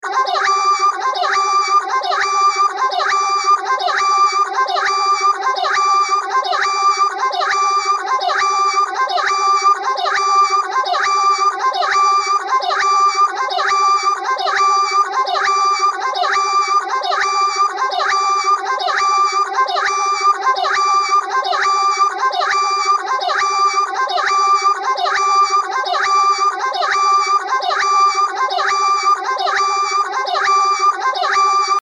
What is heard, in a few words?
Ahhhhh; Karaage